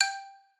xylo

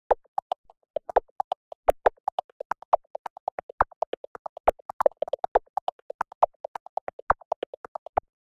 Dr Loop percus

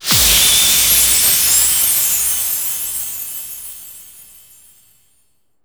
SE MAP ALL OPEN; maimai

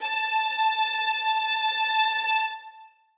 string

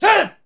SE GAME BREAK; maimai

